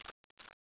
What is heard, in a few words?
mp5 clip out